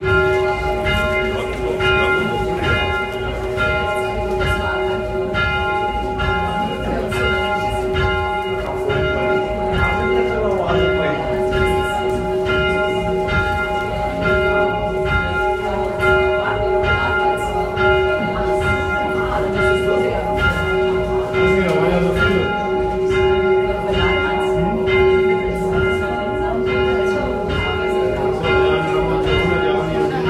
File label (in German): Kirchenglocke; Liepe